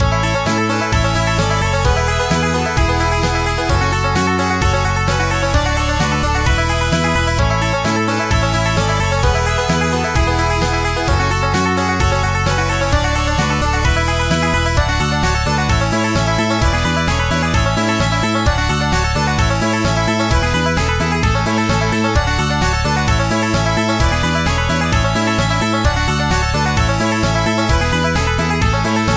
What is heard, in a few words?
Banjo Bayou Serenade; the muppets on with the show; gba gamerip